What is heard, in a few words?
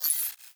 UI Weapon Zoom In; Player Ship; Warframe; Railjack